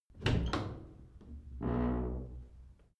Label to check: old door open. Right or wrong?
Right